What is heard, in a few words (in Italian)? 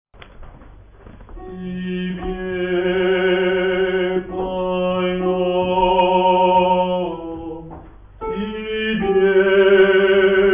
Soprani; Tibie Paiom